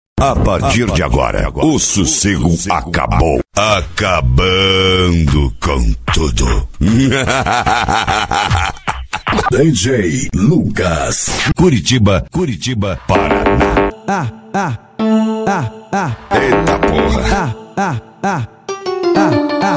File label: mega funk, eletro funk